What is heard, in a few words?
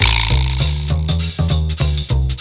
ICJAZZ; Sony Community Place; CONDUCTOR